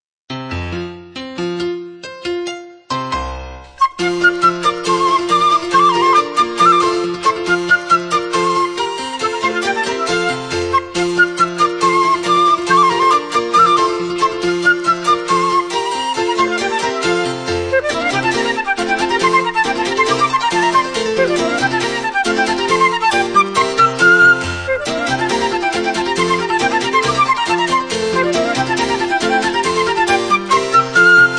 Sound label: Tambourine